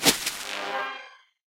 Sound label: Deathrest Casket Enter; rimworld